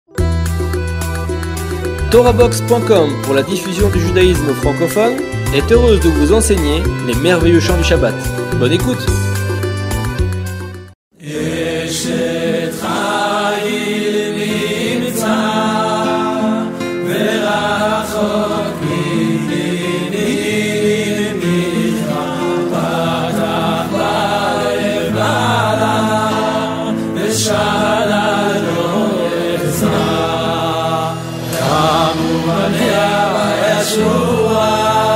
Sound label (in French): echet hayil version achkenaze, chants chabbath